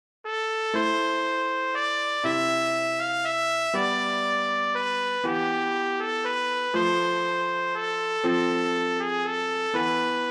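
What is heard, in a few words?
Greensleeves, Trumpet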